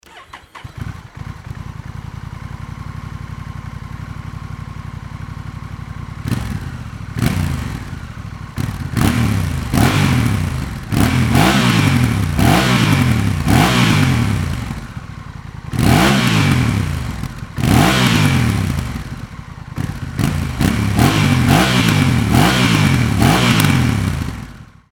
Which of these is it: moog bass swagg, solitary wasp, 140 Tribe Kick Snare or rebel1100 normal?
rebel1100 normal